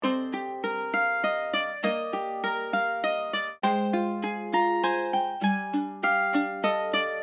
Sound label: Medieval bard mandolin dramatic melody loop